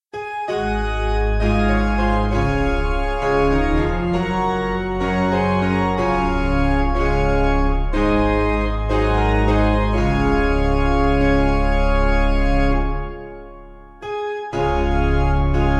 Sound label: O Piano